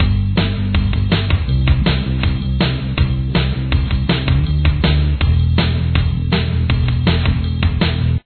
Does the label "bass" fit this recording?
Yes